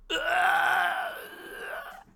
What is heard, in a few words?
enemy dead; mr bullet